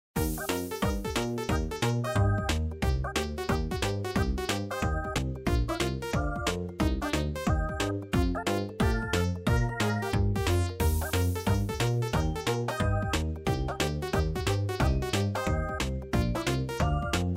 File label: background Music